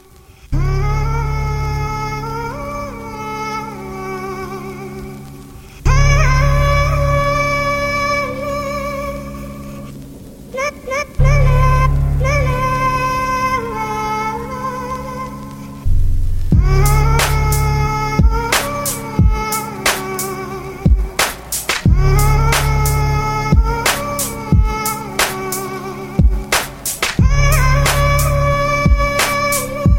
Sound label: HORRORCORE